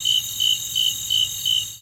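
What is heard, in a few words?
Crickets Cicadas Chirp Low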